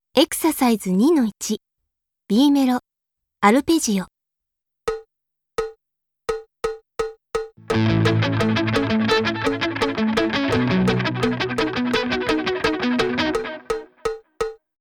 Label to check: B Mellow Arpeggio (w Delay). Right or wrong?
Right